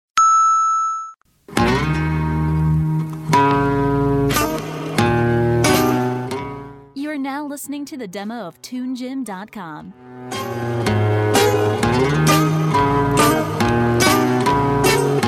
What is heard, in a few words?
Silver Guitars